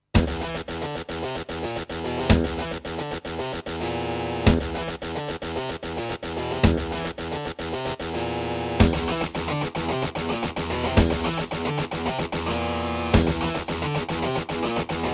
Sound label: Trust Korg Super Sound Module; Doom